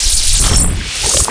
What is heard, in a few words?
disguise die